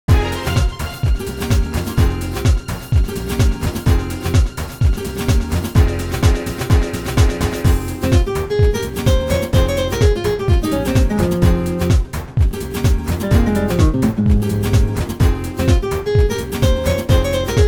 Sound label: with melody